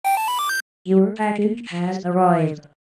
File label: Drone Drop Off